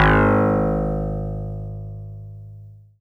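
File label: Easy Clav DX100 G#1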